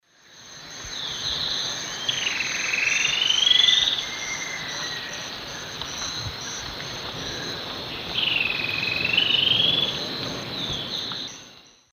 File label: Deconychura stictolaema, song; S. America; Eastern Lowlands